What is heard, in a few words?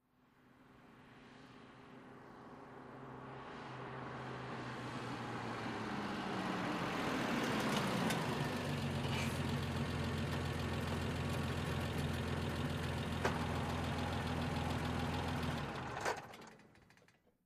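Bmw 530i, In Medium Speed, Stop close up, Off, Set Parking Brake.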